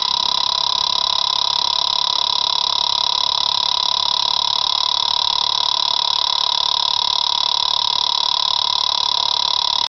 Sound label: cinematic video camera